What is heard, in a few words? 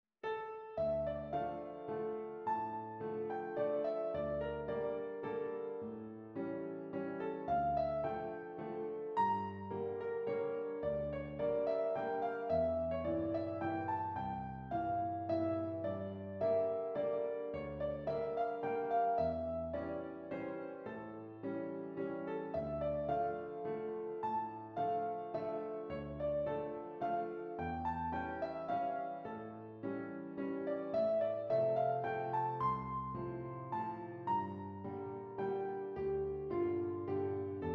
Waltz in D minor; romance